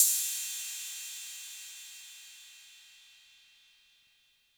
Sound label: Cym B 808 Tape Decay C; Cymbal; Clean